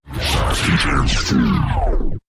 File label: Distorted Garble